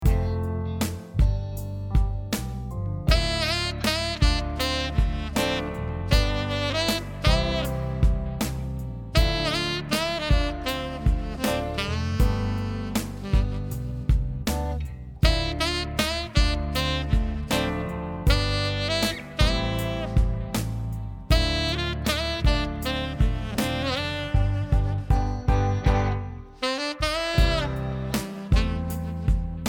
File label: sax, Saxophonist